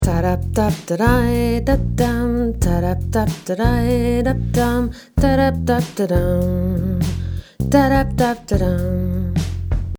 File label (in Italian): voce finale